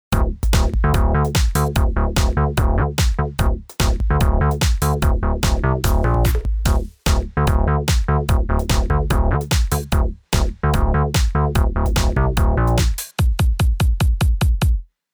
Tierra Audio Gravity VCA groove hpf on atk rel auto